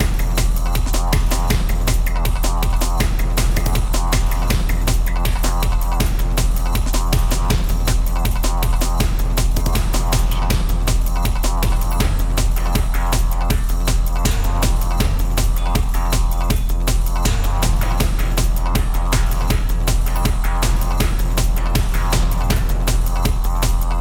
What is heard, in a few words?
Music Gameplay State Fight; Music Hideout4; H4 Level Area=Elevator; Big Guy Fight